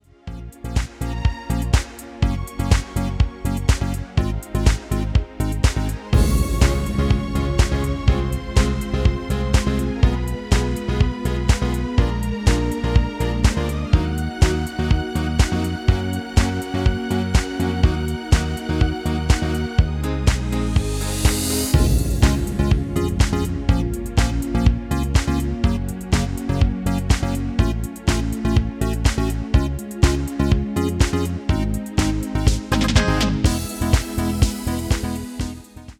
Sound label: MIDI